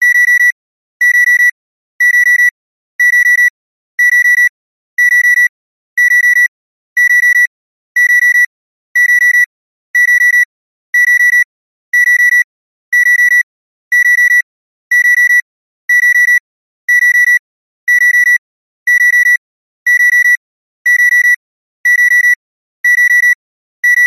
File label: household alarm clock beeps; zapsplat